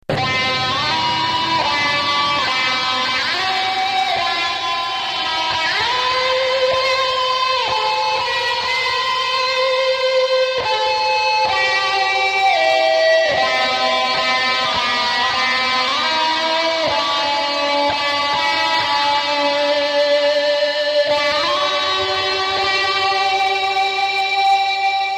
national anthem

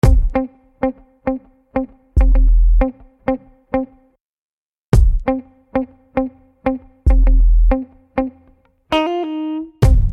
vaiolin; alternative

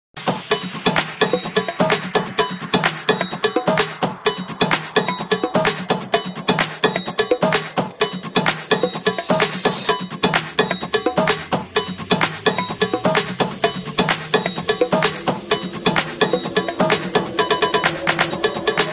awesome beat, hip hop ringtone

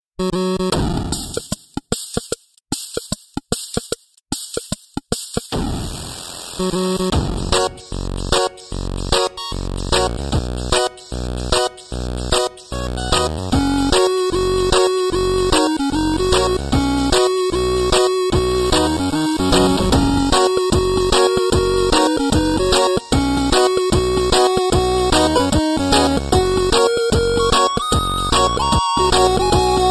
Flow 8 bit; Jabba

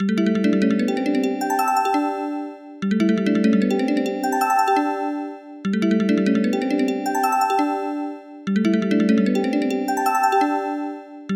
Transit Alert

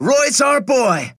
Inspirational

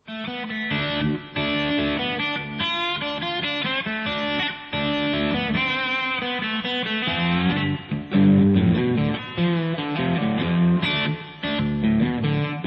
blues slide

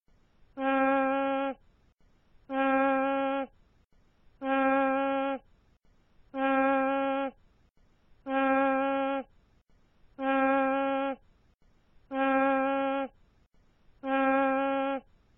Bee Ringtone

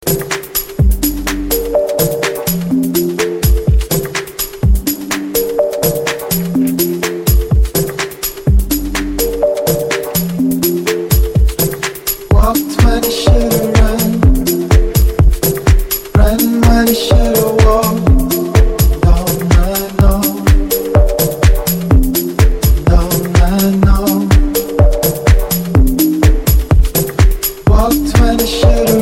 ringtone remix; electronic

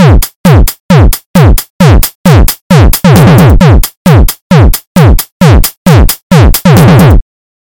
Bull Bass

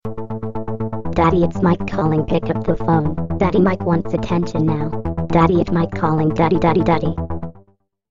Is Calling Rap, ringtones

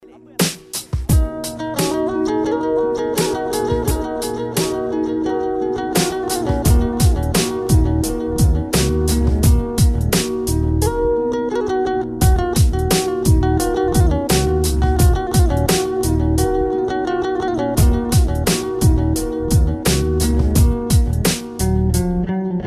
nokia variant

